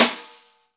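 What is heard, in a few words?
snare, Roland; Club-50 - Foundations Roland; Naturals C; Natural 13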